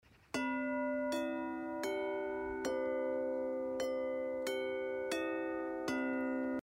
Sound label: Harmony Bells C4 C5; Rood